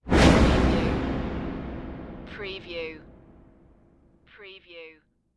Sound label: Whoosh; Sound Effects Bible